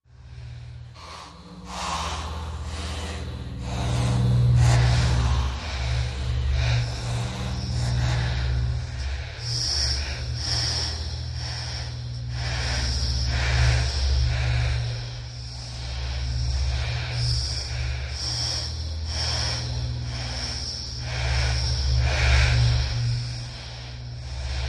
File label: Breath Bad Air Ghost Voice